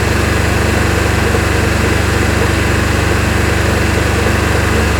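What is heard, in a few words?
Generator Ambience Loop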